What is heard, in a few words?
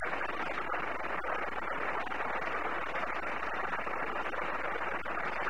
Moto3600 Type-II Slow; Current Motorola Control Channel (SmartNet)